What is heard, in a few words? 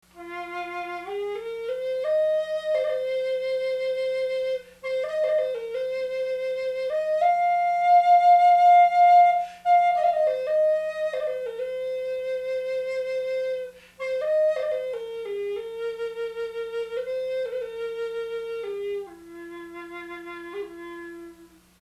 flute song